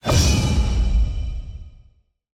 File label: draft notif pick snipe